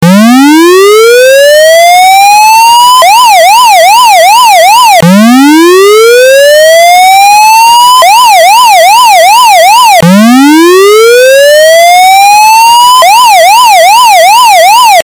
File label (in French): sirene